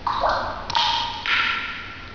BUBLES, Water

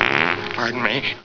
fart; Nare Gewoontes

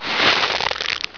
RIP; sound effect

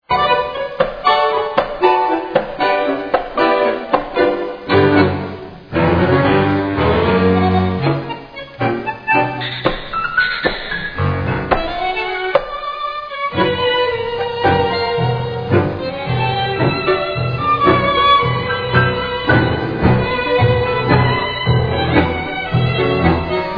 Tango; Rosegarden

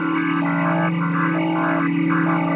pod cockpit telem loop

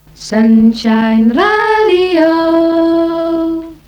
sun Shine Jingle; SunshineJingles

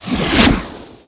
poof

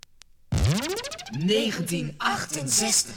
jinglepackage; topformat LP jinglepackage 1

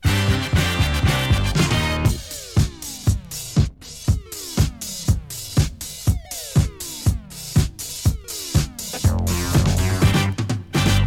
topformat maxi jingle single

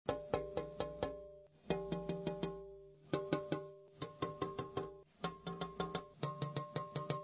glass tapping; Zoemtonen hisses pingen woesjes tikjes

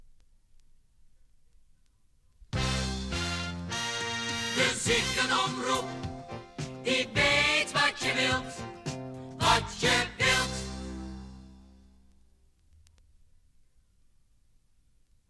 topformat maxi jingle single

